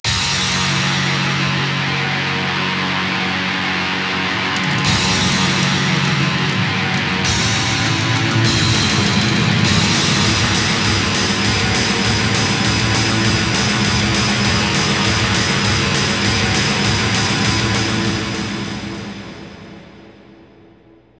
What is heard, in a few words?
Str G#